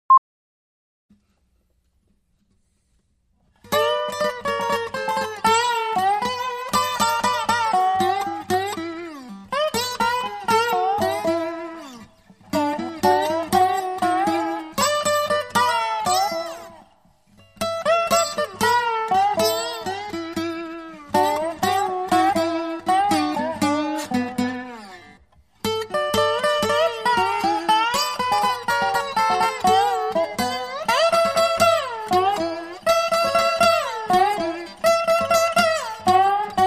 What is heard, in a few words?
Delta Blues Duet